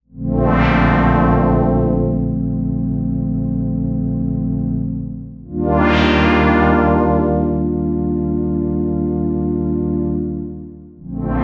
Poly Synth

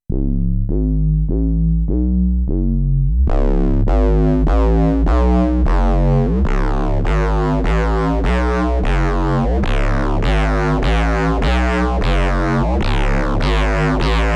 Triangle Clip; Korg; Corrosion

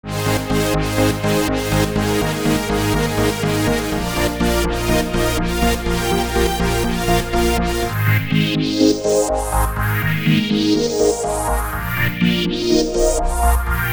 Motion Synth; Whirl

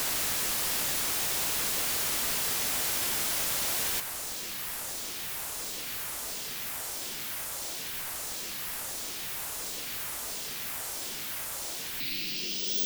White Noise

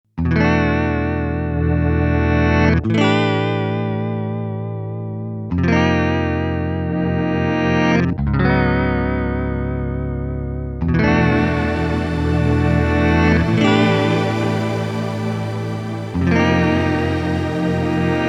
KORG Luminance Electric Guitar

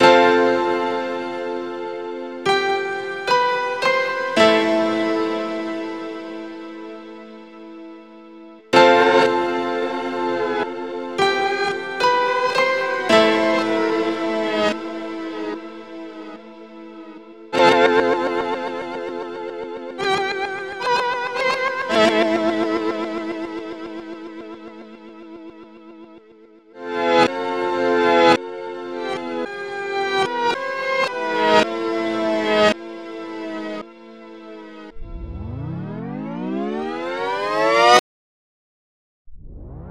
Acoustic Piano

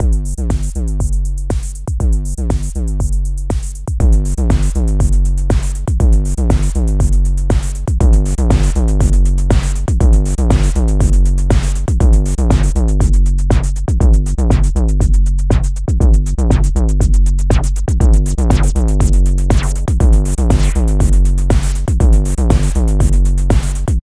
Polyphonic Filtering and Drive (minilogue xd); KORG Groove

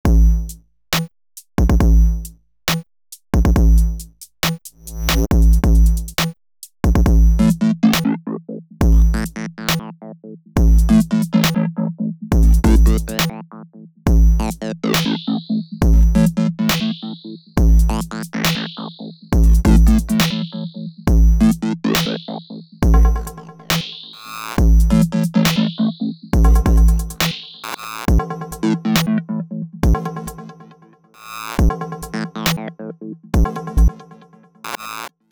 Bit Step; Multitude

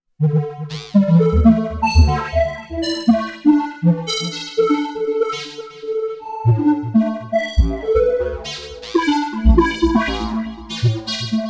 KORG Odds Stochastic Bit Reduction

